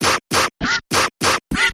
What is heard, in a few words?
Mechanical Percussion Variation Short Version